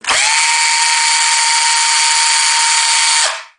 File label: drill, industrial